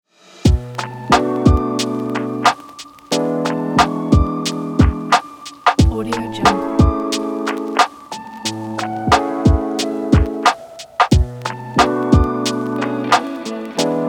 chill beat lofi hiphop lounge

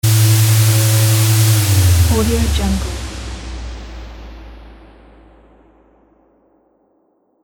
noisy engine power off

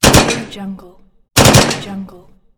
machine gun

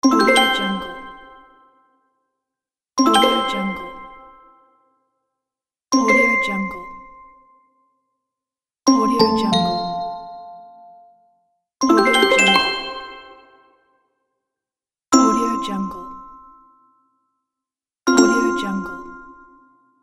magic notifications; garnavutka-nonexclusive

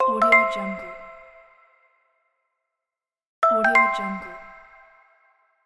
good idea

